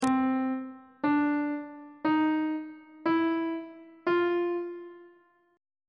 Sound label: d double flat dim3 min3 maj3 aug3 intervals; treble clef